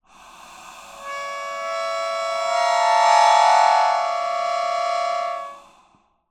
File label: Clusters, Melodica